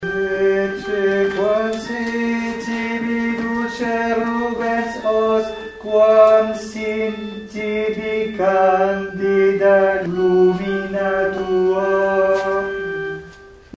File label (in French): soprane; ecce quam sit